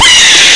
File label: aaargh; charley cat at the flix